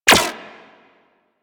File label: Shield Impact; Warframe; Warframes; Volt